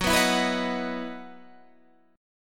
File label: E Major 7th Suspended 2nd, F sharp